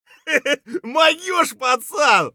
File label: scav cheer; eft